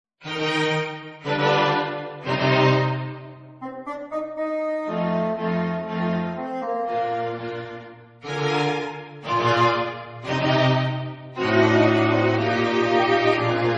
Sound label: Quartet